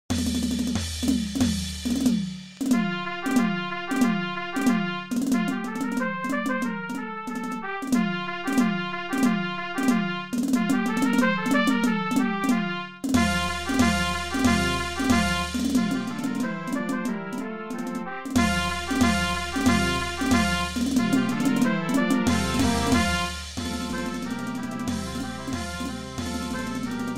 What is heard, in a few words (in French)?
chants du peuple, fanfare si b; midi